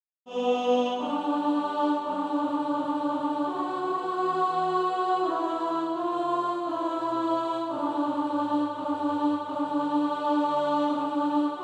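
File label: alto, Practise Music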